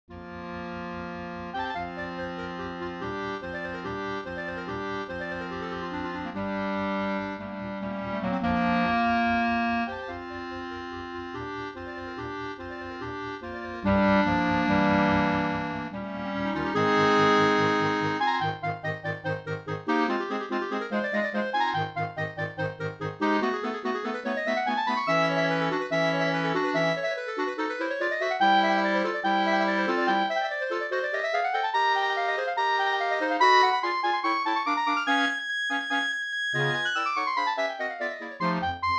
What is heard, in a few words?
TAMBOURIN, clarinet